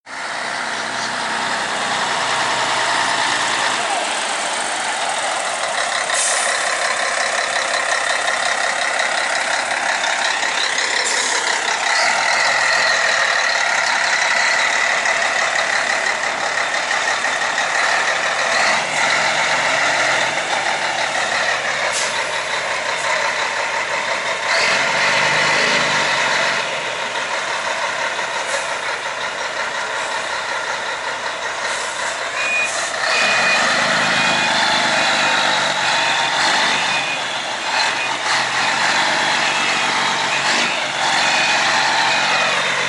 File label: Scania K113CL; Viaggio